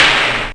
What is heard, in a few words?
CRACKED CY, Techno Trance; E-MU Formula 4000 Series Vol. 2